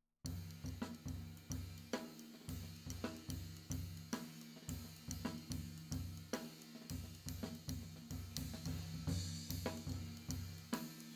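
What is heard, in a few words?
Floor, tom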